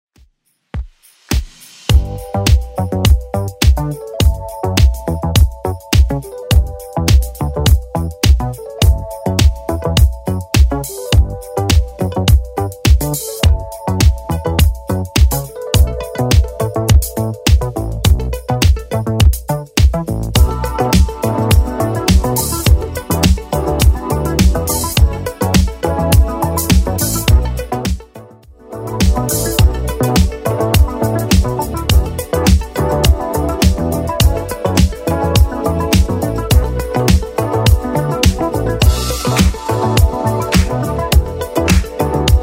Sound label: 80s Redrum 104bpm